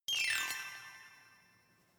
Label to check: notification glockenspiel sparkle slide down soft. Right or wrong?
Right